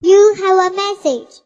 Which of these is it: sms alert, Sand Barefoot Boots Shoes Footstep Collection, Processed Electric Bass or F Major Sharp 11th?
sms alert